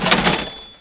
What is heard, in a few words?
bonus flyer appear; F.A.R.M. Patrol; MacEasy Magazine CD - Issue 18